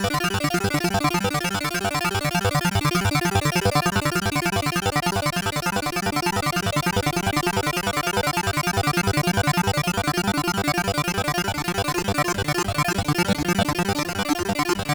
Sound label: TEKNO, Hyper Dance, FX; Best Service